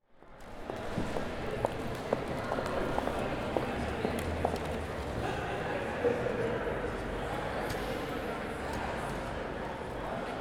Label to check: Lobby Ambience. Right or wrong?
Right